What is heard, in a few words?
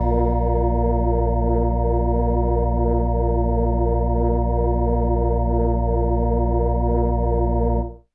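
Indie Organ Kawaii A#3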